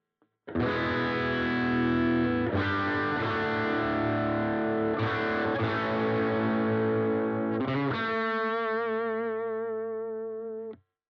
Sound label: DUAL CUBE Hi Gain Stack dry